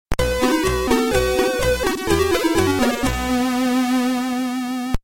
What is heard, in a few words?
Level Complete Game Complete, commodore 64; sas combat simulator gamerip 1989